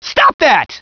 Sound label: hurt soldier